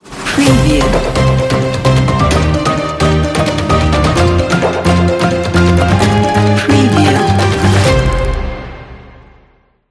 news logo

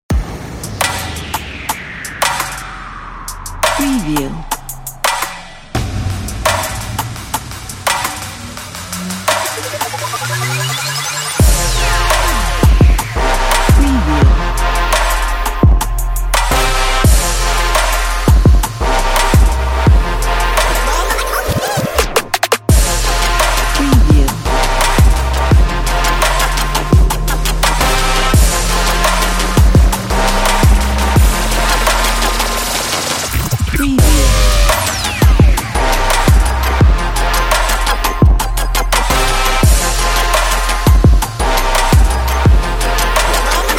future bass trap

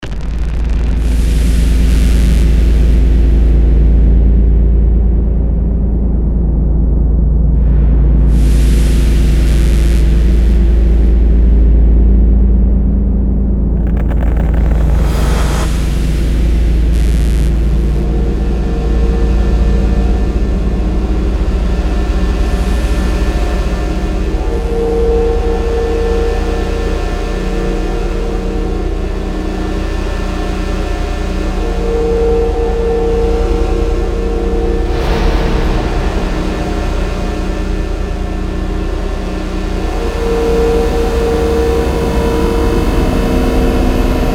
dark cinematic soundscapes and sfx

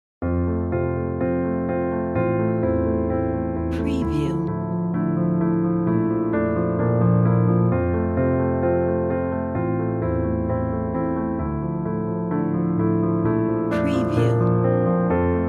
lovely piano ballad

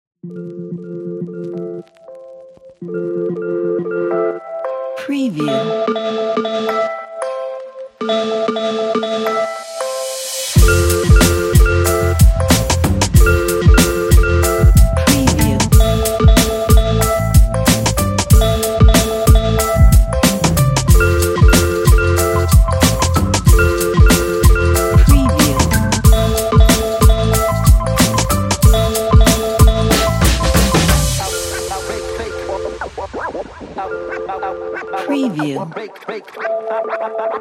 lifestyle street hip hop

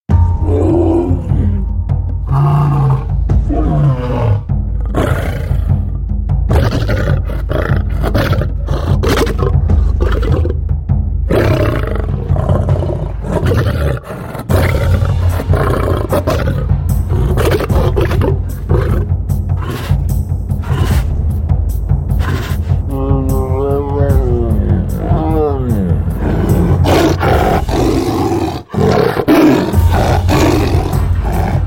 Wildcats; BOOM Library

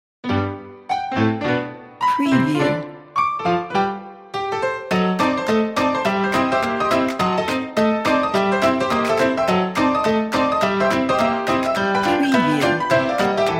playful logo

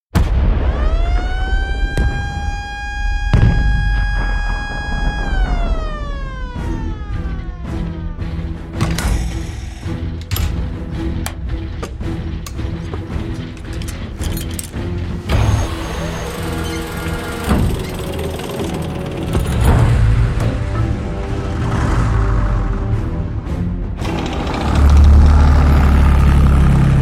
World War 2 Tanks; BOOM Library

